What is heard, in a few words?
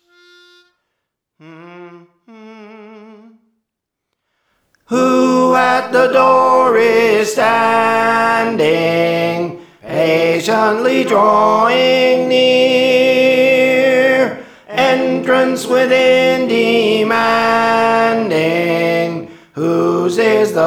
Tenor; Praise For The Lord Training